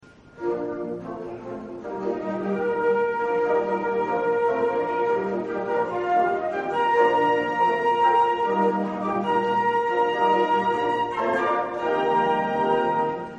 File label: concert, flute